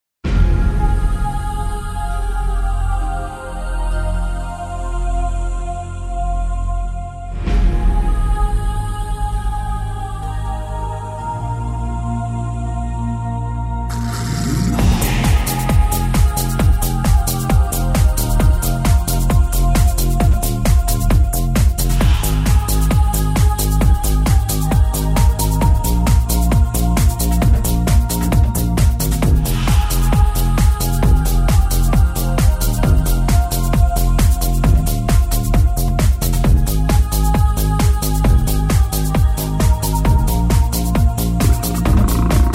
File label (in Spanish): Musica